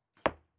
archery foley arrow impact

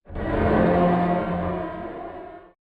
Horror Low Screech; SoundsCrate

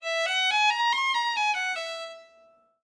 Violin Whole Note Runs

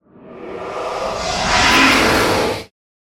ghost scream; soundscrate

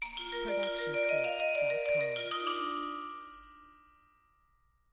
Xylophone Ident

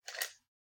pens drop; soundscrate

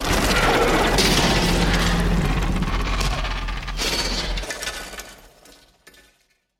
metallic crash; soundscrate

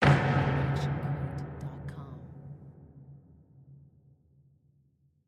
designer stutter medium boom hit; SoundsCrate

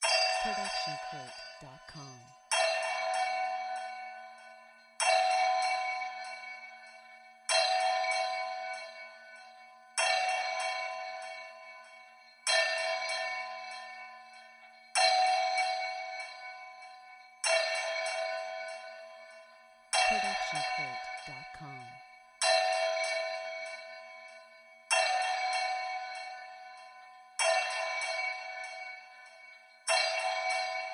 noon bell; soundscrate

